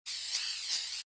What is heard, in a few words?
painting